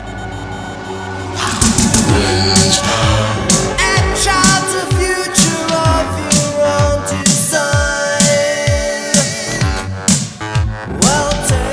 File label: wind power